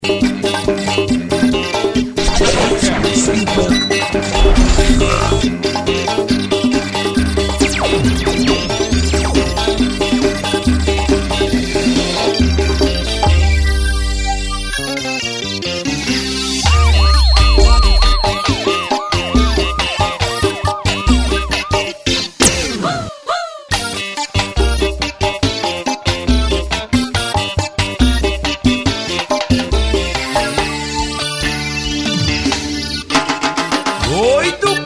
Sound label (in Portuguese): abertura com vinheta